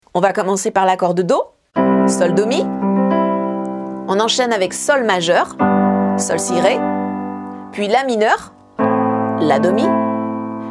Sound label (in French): ACCORDS SIMPLES accords de passage